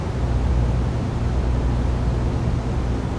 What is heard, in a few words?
room tone warehouse; Left 4 Dead 2